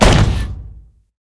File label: metal impact